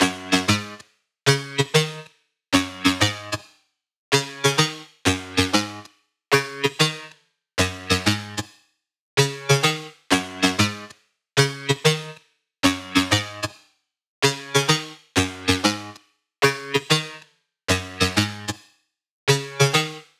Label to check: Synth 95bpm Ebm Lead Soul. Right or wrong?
Right